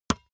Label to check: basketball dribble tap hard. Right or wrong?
Right